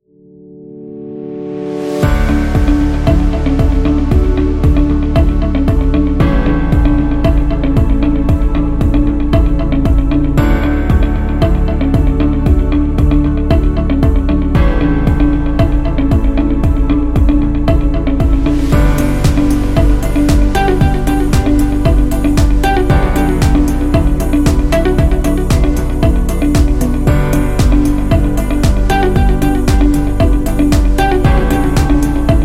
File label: Corporate Positive